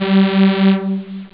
string; Amiga Soundtracker sample

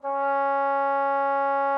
trombone C#4; Xsample VOL-3

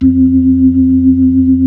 B-3

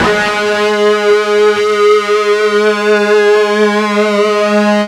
FUZZ G#2, Guitar; East West; Steve Stevens Guitar Samples Collection

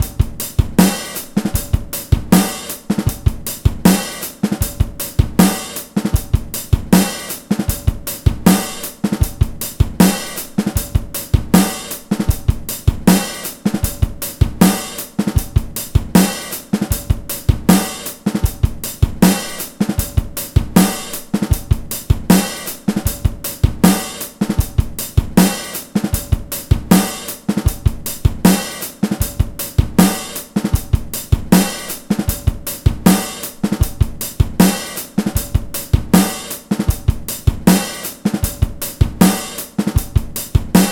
78 bpm, Breaks; 90 s Sample CDs; Planet of the Breaks

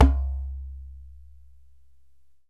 DJEMBE

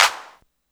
CLAP HOU, Dance Mega Drums; Best Service

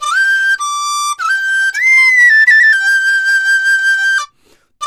DIZU, 90; INIS - Opium; DIZU 100-C

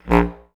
BCS STAC A#0; Best Service; Peter Siedlaczek's Advanced Orchestra

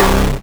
DM BAS, Dance Mega Synth

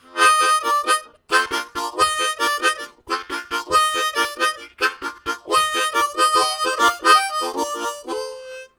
Vamping, Harmonica; Zero G

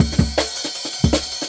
160, Jungle Breaks; Original Jungle Breaks

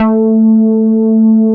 JNO MELO A4, MELLOW BS; Drums In Your FACE!

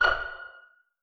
STR PIZZ C5 PP; Sound On Sound - Peter Siedleczek's Orchestra

